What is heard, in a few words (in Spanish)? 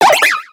Grito de Emolga